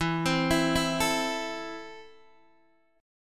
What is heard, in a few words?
E Suspended 4th Sharp 5th E, down, Guitar, DADGAD